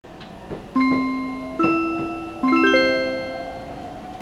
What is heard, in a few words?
chime